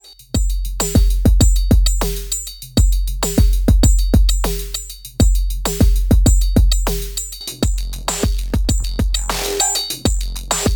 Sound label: Elektron Analog Heat, Overtone Generator